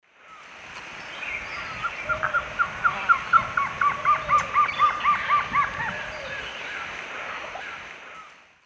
Trogon curucui, song; S. America; Eastern Lowlands

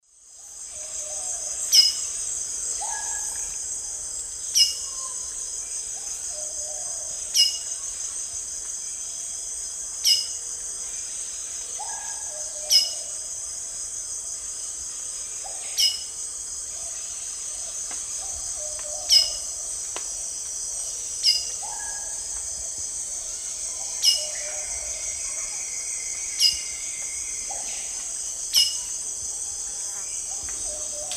Saltator coerulescens, call; S. America; Eastern Lowlands

